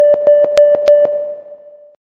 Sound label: text notification